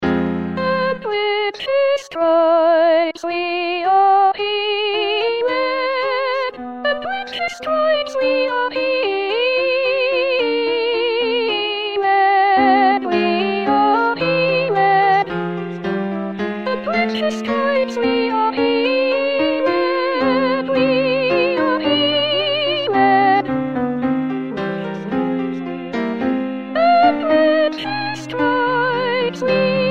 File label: Soprano; Handel; Messiah; And With His Stripes